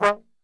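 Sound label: G#1 trombone shot, Brass; Best Service; Brass Super Section; TRMB SHOT; 90 s Sample CDs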